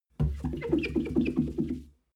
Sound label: clean window paper towel rub